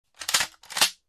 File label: Winchester 12 loading